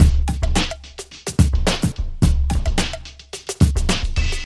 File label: Hip Hop Cowbell Beat